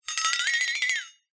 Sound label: cartoon flexatone